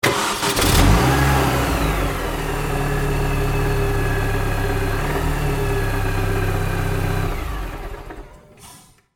transportation car start off external garage mini cooper bmw; Car Start Then Engine Off - Recorded Externally Of Car In Medium Sized Garage - Mini Coope; Cars (Sound Effects:Vehicles); McKinney Sound